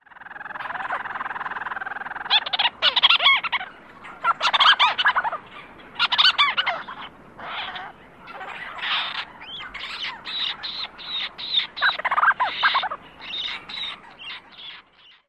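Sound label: Leach's Storm-Petrel purr, chatter call and squealing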